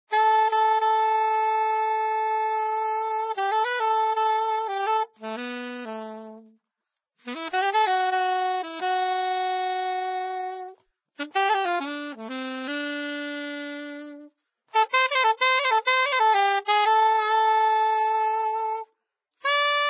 Saxophone; Zomboid; Lifestyle

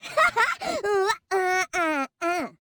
Woman Dance Happy; Zomboid; Lifestyle

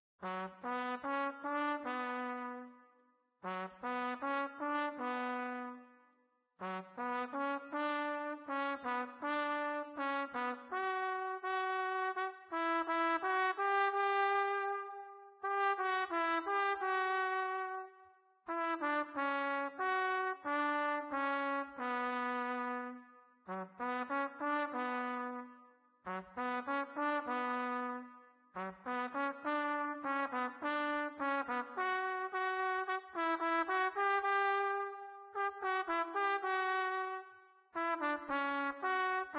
Trumpet; Zomboid; Lifestyle

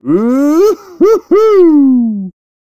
Man Woohoo; Zomboid; Lifestyle

